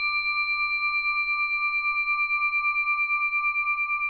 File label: ding ing ing ing